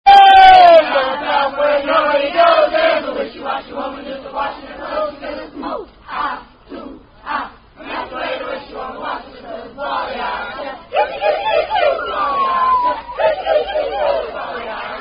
Camp Songs; Wishy Washy Woman

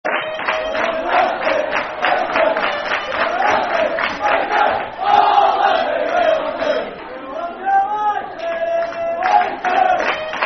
Football Chant, Soccer Song; We Love You Livingstone; Livingston Lfc

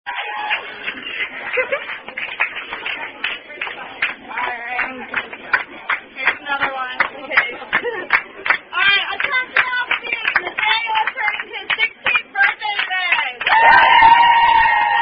happy happy birthday; 16th B-Day At Apple Bees

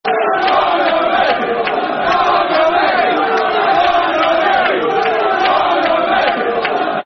Barmy Army Football Chant (Soccer Songs); Preston North End Pne

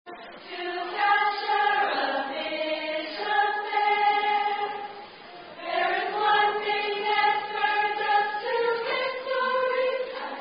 Alpha Kappa Alpha Hymn Sorority Song

